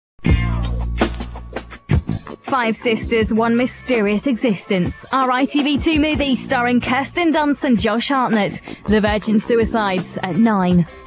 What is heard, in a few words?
jingle; itv2; replay